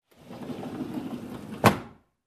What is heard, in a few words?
Sliding Door close